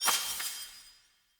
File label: Player Melee Perfect Strike, Swords; Weapons